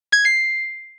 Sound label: point, flappy bird; Extra2D